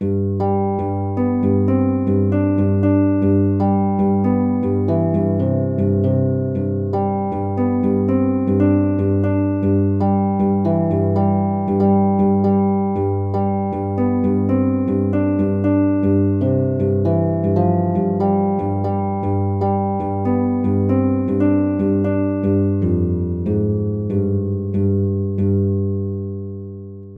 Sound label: menu